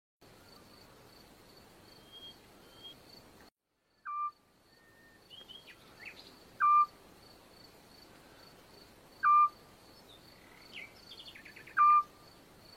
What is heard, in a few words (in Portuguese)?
Canto da coruja dos urais